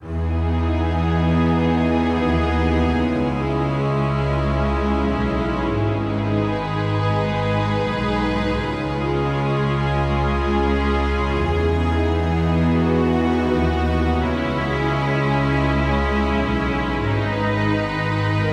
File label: Generic Emotional; Valthirian Arc Hero School Story